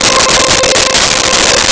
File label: Viola C5; Nintendo; July 2020 Gigaleak